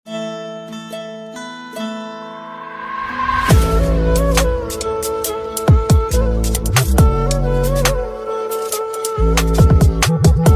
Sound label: flute ringtone, instrumental ringtone; jodha